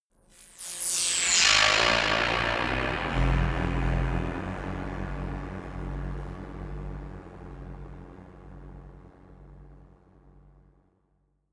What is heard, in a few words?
cathedral noise drop